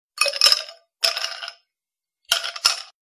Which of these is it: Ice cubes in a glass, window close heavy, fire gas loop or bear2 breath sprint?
Ice cubes in a glass